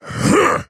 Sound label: attack effort small; dota; axe